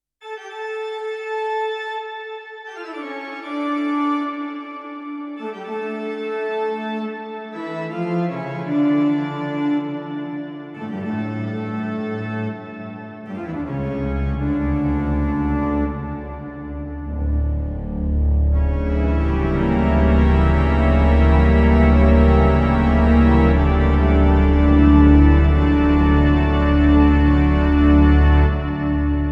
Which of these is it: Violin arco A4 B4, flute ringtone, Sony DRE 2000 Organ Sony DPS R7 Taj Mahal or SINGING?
Sony DRE 2000 Organ Sony DPS R7 Taj Mahal